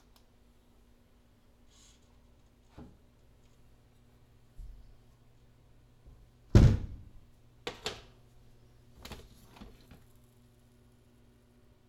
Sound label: Cup of Coffee, binaural